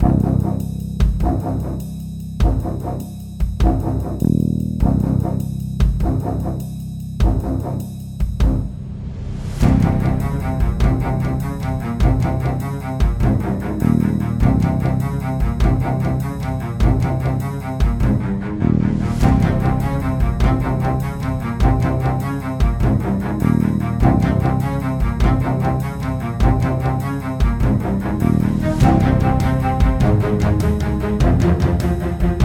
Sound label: Action Desperate Escape